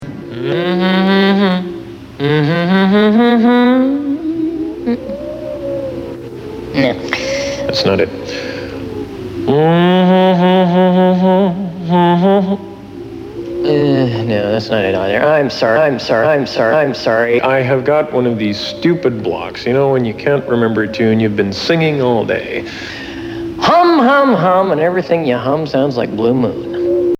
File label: Hum; Max Headroom; The Max Headroom Show; Jack Lemon